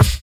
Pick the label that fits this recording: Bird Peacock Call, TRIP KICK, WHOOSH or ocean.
TRIP KICK